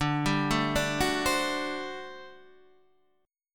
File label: G Major 7th Suspended 2nd Suspended 4th